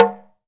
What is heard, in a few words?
TALK DRM, Heart of Africa; Spectrasonics